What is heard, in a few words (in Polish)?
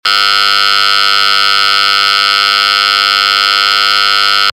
ton